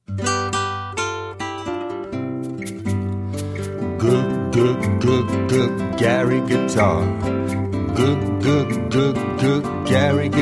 Guitar

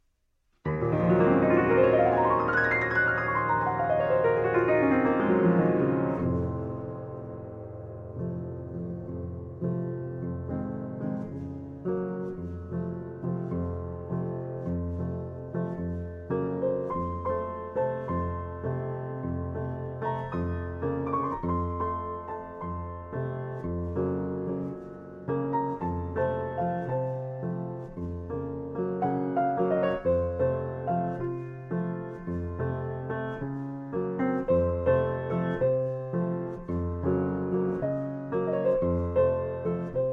Piano; Schlossberg

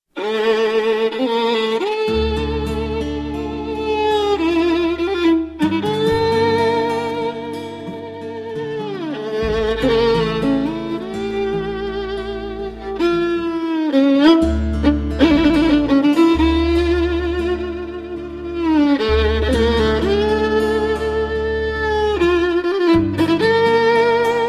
Fiddle

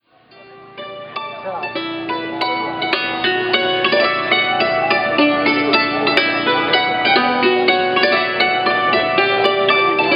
hammered dulcimer

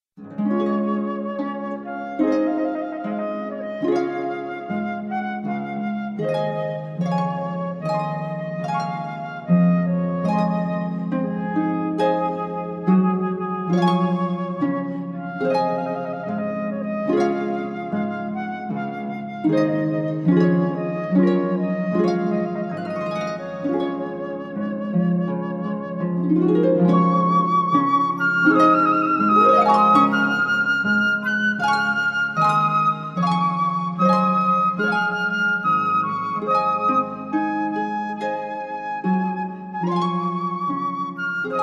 Trumpet Voluntary

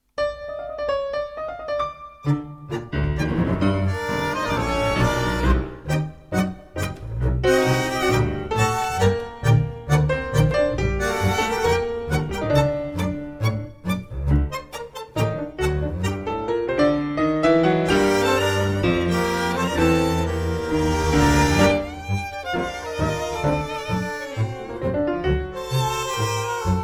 La Cumparsita flute

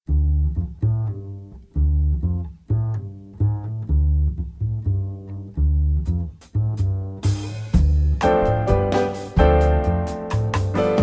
Flute Wave

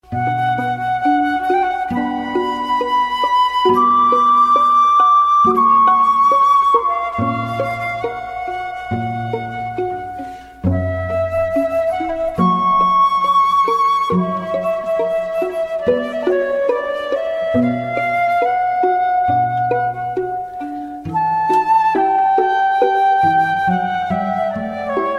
Flute Quartet in D (adagio); Mozart

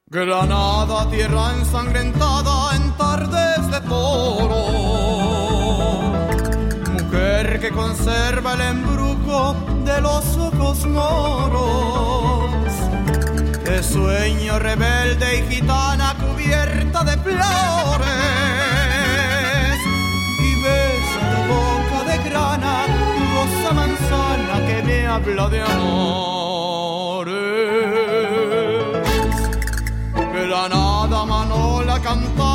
Vocals

